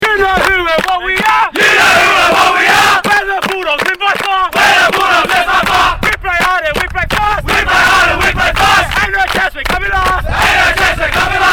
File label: sing club song; bulldogs; 2011 nswrl